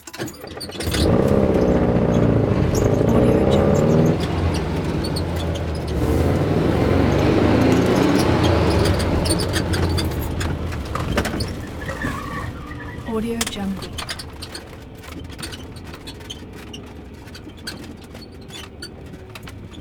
volkswagen creaks and squeaks interior driving

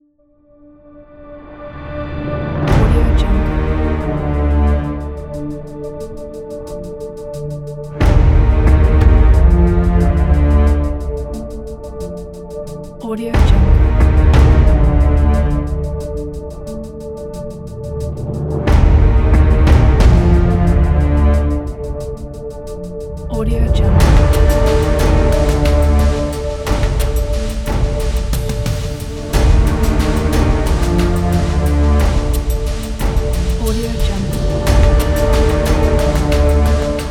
suspense action drama

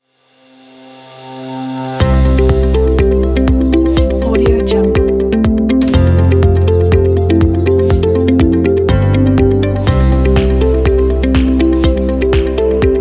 happy uplifting

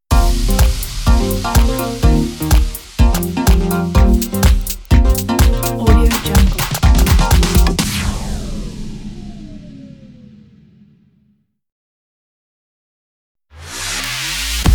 fashion idents pack; kipaloops

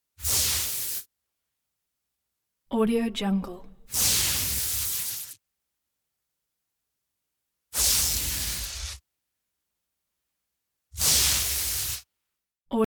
white light short searing bursts rumble hiss

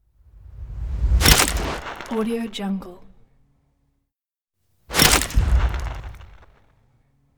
camera surreal rumbling whoosh in

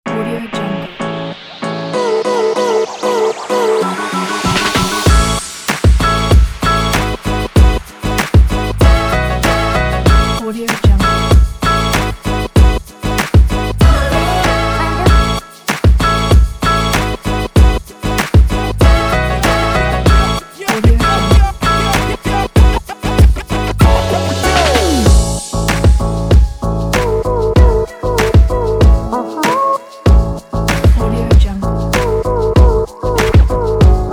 boom bap

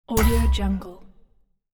hardcore objective complete ping

